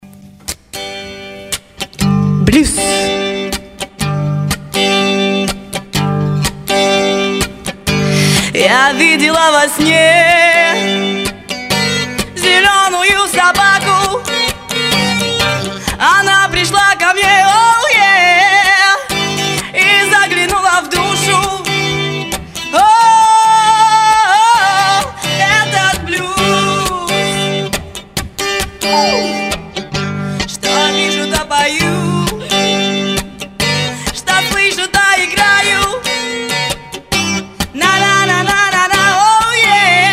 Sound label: blues